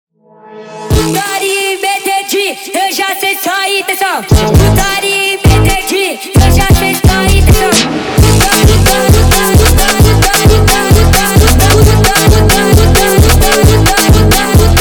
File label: Montagem sped Up